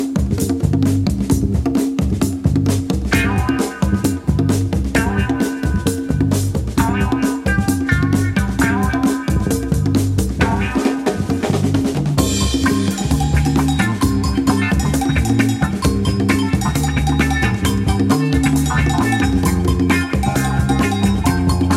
Percussion Blues; Soundtrack

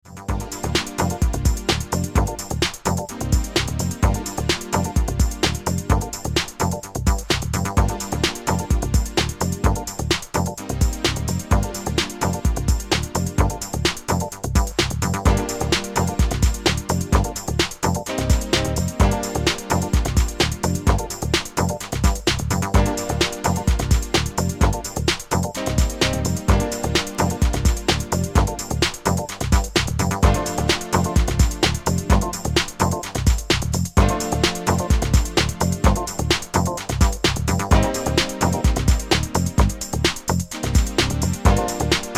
Instrumental

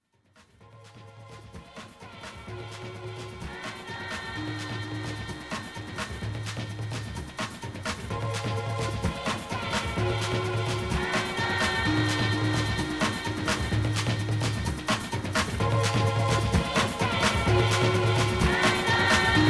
African Rhythms

